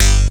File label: bass